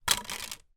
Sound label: household dvd discs drop on table